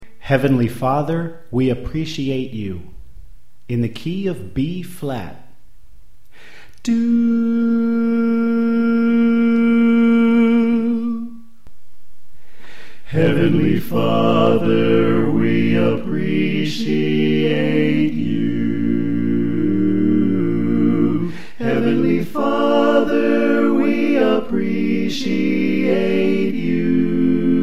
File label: Soprano Left